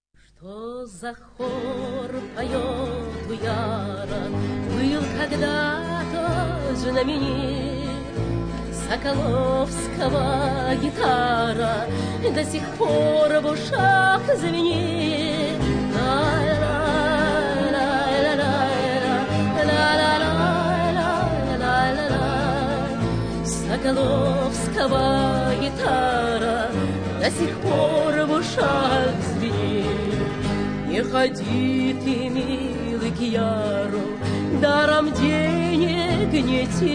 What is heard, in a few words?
guitar, Songs Of Russia Gypsies